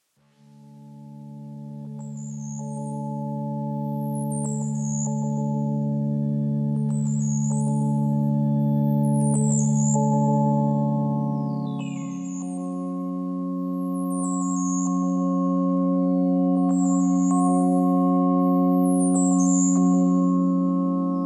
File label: ambient meditation AMBIENT INSTRUMENT